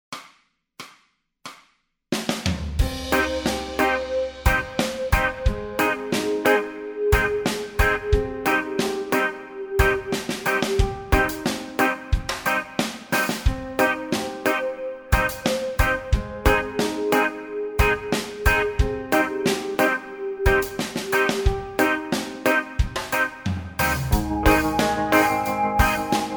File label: bass modes, backing tracks